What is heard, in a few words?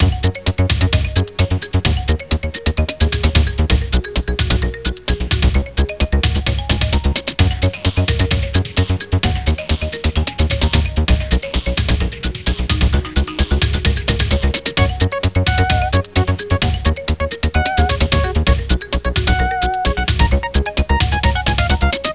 Jazz; Nancy Drew: Secrets Can Kill